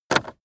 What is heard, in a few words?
car temperature knob turn short fast